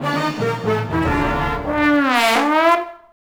music race lose; Ty The Tasmanian Tiger 2 Bush Rescue; XBOX

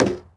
Wood Hit; XBOX; Amped 2

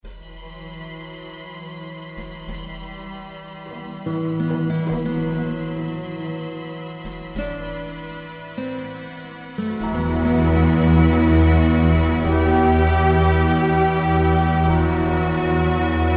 slow, music; Soldier Of Fortune II Double Helix; XBOX; colombia

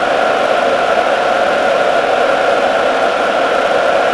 Large Crowd Sing Loop; David Beckham Soccer; XBOX

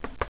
knock, poker

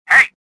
anger; Star Wars Jedi Knight II Jedi Outcast; XBOX

